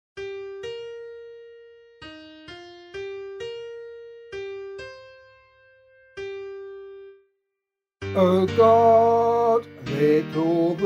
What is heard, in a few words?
Psalm vocal